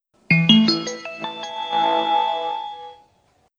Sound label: ring; ATOS